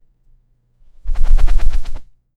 giant bird flapping wings; animal

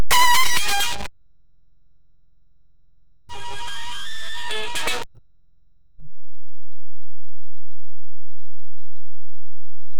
make another sound of a, bird singing

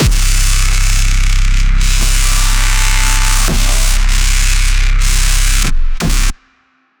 dubstep sustain bass, trap

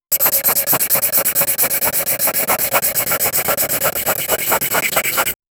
asmr sound effect of a, pencil

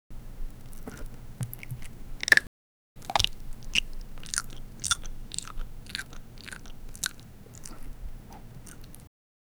realistic asmr of chewing; mouth

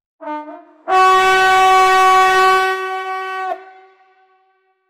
rams horn rapture call, wind instrument

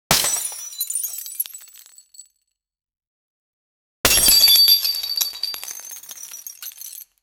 a sharp realistic glass; broken

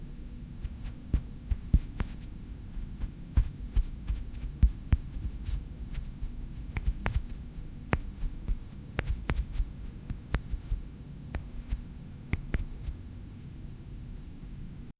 the movement of fingers p; human sounds

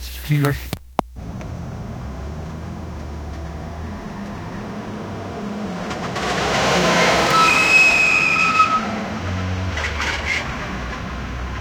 cars tires screech while; driving

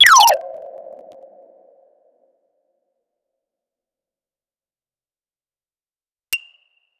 electronics, sound-effects

